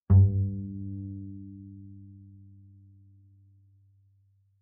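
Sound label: Bass pizzicato fortissimo sul D G2, Double Bass; MIS Pitches - 2014; Strings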